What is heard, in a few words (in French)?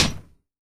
claque